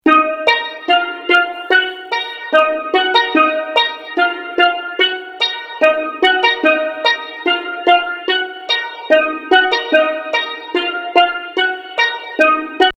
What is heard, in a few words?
IGS Audio One LA Bells